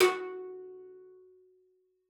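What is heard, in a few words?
CELLO spiccato F#4; 90 s Sample CDs; Xsample VOL-5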